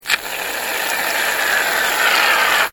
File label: household shaving foam spray; Aerosol Shaving Foam Spraying / Squirting From Can; Bathroom; McKinney Sound